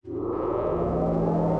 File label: electromagnetic plant warmup; Factorio